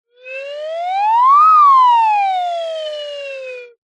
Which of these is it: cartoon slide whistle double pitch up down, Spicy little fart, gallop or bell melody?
cartoon slide whistle double pitch up down